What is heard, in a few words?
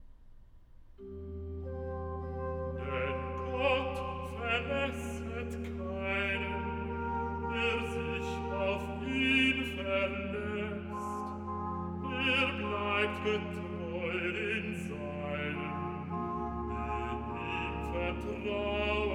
Recitativo Basso; Cantata No. 107, 'Was willst du dich betrüben' BWV107; Bach; Philippe Herreweghe; Cantatas